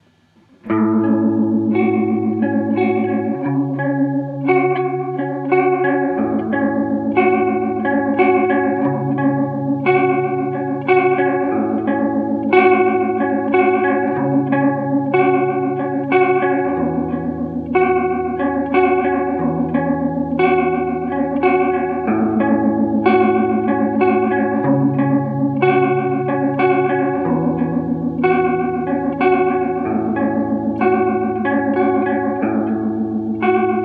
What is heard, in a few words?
clean + Flanger mic